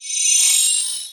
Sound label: shooting star; Mike Koenig